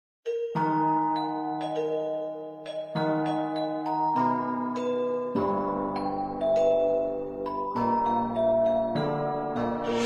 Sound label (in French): hymne instru mordavie; reflets d acide